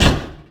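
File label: metal pole soft, Impact; Cars; XBOX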